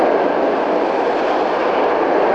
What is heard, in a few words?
Coaster